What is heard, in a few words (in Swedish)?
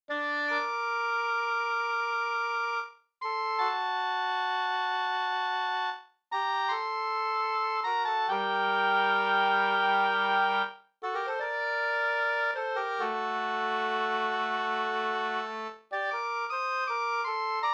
vallat